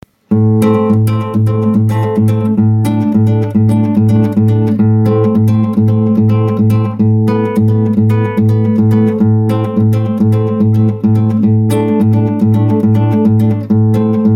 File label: Acoustic